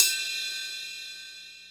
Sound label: Ride, Ensoniq ASR-X